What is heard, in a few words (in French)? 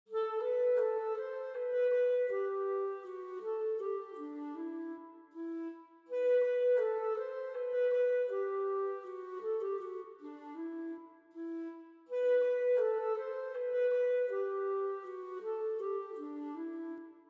Clarinette en Sib; Les Valkyries